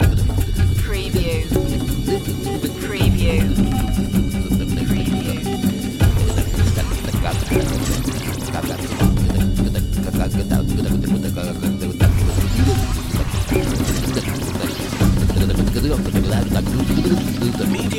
ethno scat loop

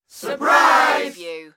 Crowd Surprise

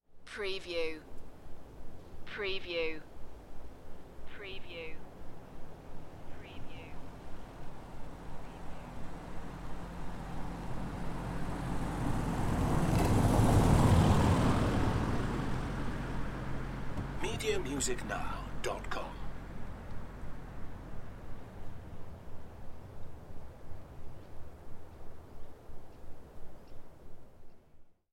Welsh Forest car park drive by; beanstalk audio

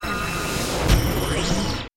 Glitch; Sound Effects Bible

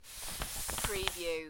paper slide

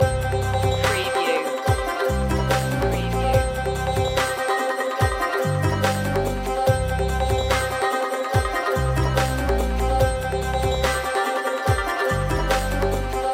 indian chase loop

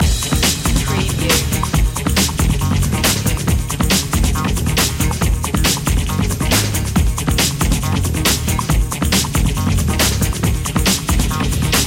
check point loop

